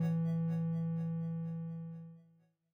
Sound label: outro; yums